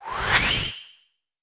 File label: woosh